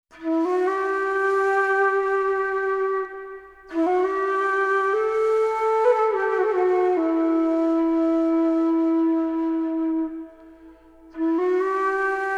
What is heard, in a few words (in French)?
Flute Silencieuse